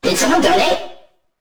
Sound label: alert; roblox; coolkidd; slender